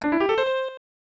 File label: Tada; Heisei Shin Onigashima; snes